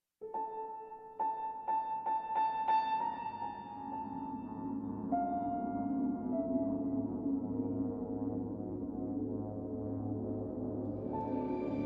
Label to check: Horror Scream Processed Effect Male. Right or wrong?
Wrong